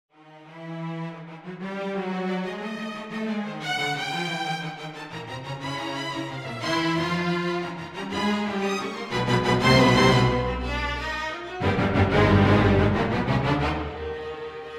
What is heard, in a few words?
viola essay